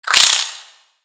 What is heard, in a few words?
Spider Rage; Lego; creatures mechanical